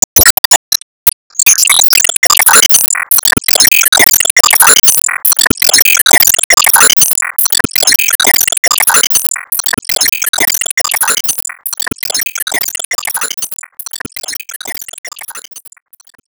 Reserve Loop

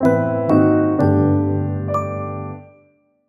Lowscore

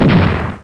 Explosion Sound; kart racing; Boom Karts; Android

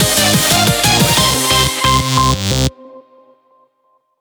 Bgm Reach

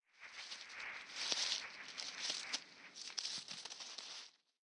Flower Snake Scurry; Lethal Company

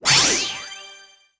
Item Get; Se Item Get 01

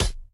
Weapon Bone Big Base

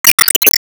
Shot Smash

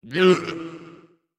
Effort Tiny

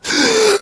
Breathin

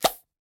Bounce; Fizzle

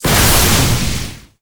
Smash Shot Gold; gamerip

